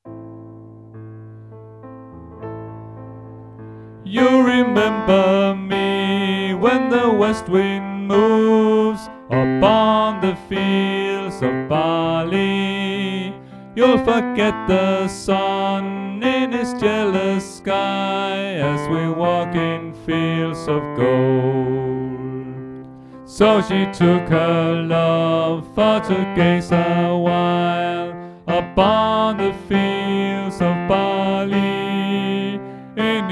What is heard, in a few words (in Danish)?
Tenor; oevefiler